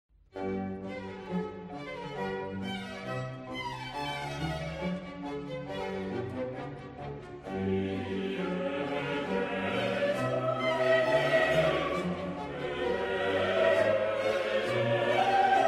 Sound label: missa rorate coeli desuper kyrie